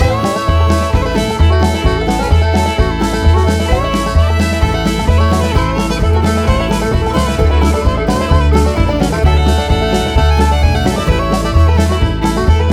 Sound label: bluegrass; great smoky mountain